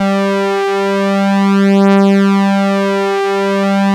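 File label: ARP lead G2; Sonic Reality; Vintage Timetraveler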